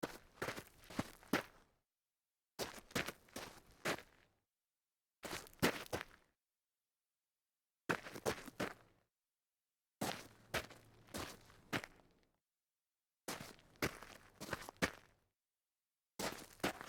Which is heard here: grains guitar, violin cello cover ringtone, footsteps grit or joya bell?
footsteps grit